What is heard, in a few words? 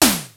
Yamaha RX-5, drums perc; Rubber Chicken Software Sample Bank